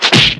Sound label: Blow